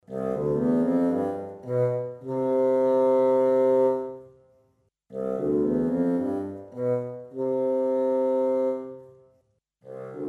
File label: woodwind fagott Mikropositionen stereo near mid far